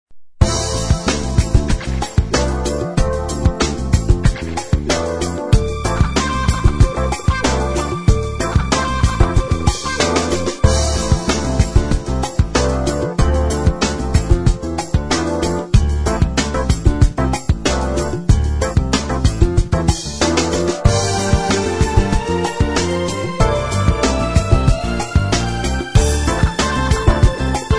FUNKY; Suite Collection Vol.5

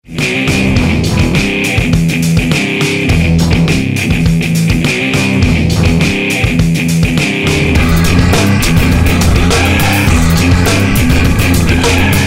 NU METAL & SCRATCH; Suite Coll. Vol.16

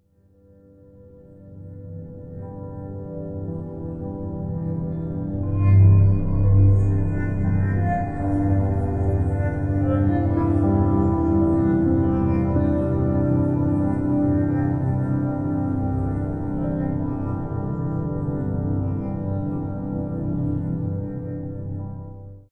PIANO LIGHTS, New Age; New Age Coll. vol.5

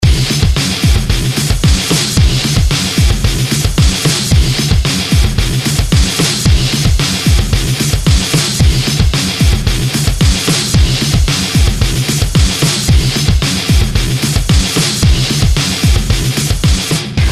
INDUSTRIAL ROCK; Suite Coll. Vol.16

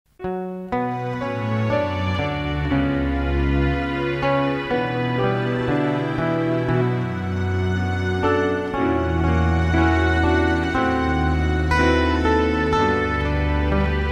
EKTARA; Suite Coll. Vol.15

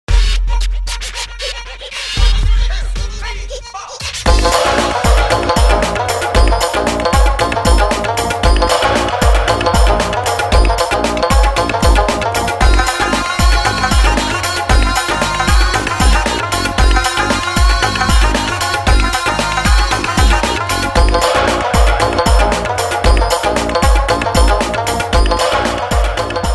BENGALI DANCE; Etnik Coll. Vol.3

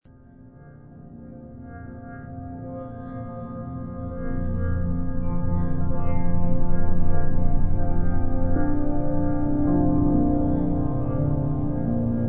PIANO; New Age; New Age Coll. vol.5

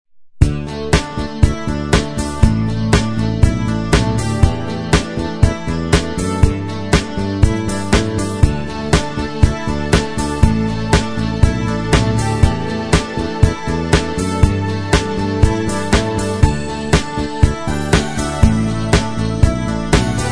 Happy Rock; Suite Collection Vol.1

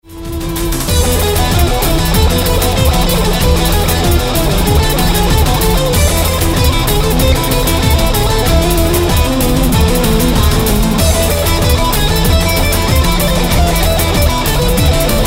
Spanish rave; Suite Coll. Vol. 17

